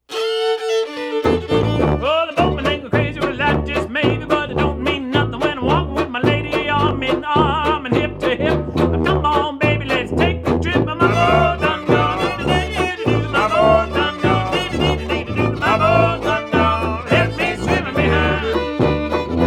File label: swimming blues